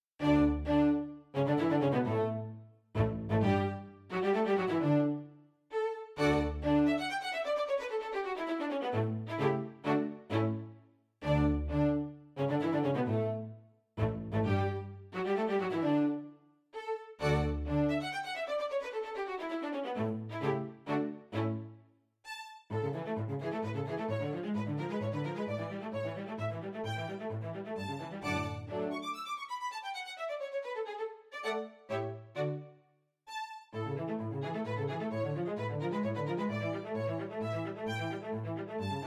Menuette fur Orchester D Dur

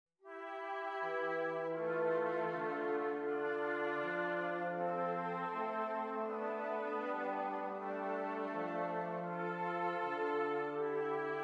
Stimmiger Contrapunkt; Ronge

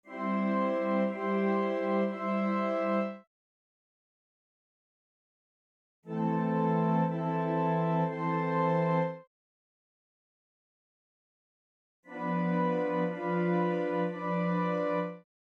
Zweite Verwechslung Quartsext Accorde

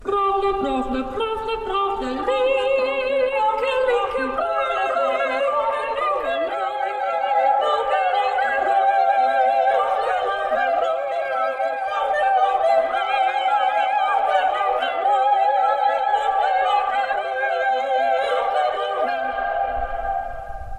Vierstimmiger Kanon; Brauchle linke

